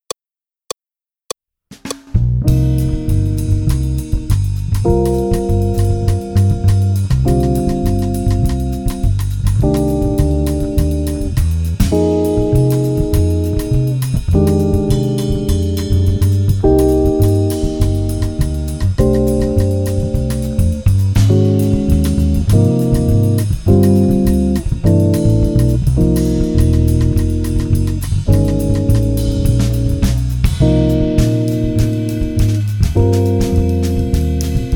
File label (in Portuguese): blues menor sem guitarra; Jazz Harmonia